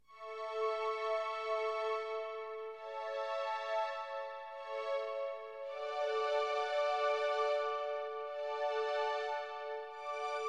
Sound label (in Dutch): menuet